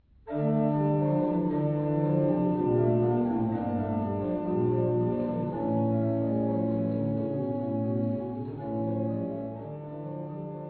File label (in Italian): principale flauto